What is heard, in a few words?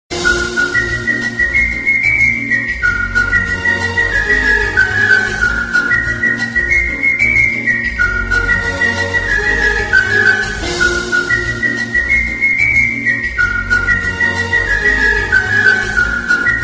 Rhythmic Romantic Whistle